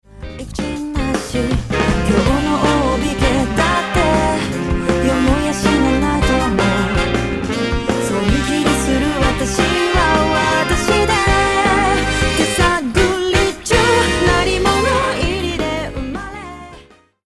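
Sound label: Horn Dual All Vocal On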